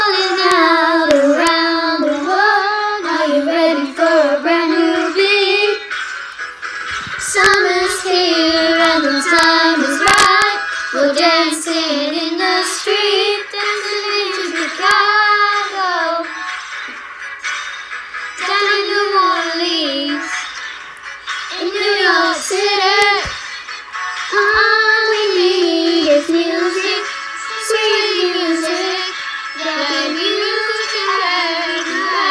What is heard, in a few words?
singing